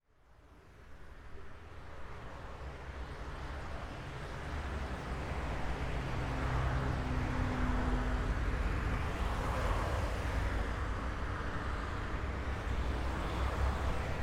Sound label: Street